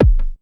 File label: Kick, Ensoniq ASR-X Pro